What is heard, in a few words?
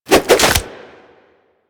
Nunchuck Quick Melee Return; Warframe; Infested